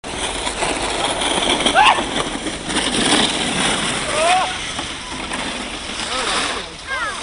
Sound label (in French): luge